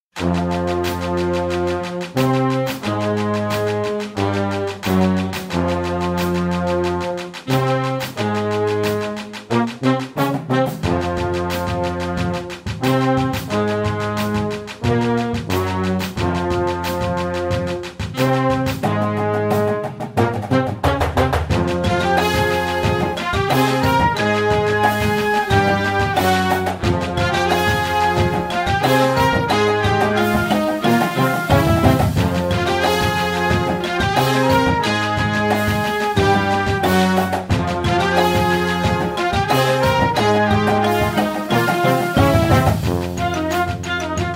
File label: Hip Hop Stadium Blasters, Marching Band; Geneva